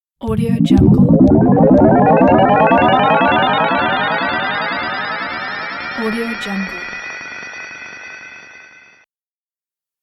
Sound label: digital glitches sweep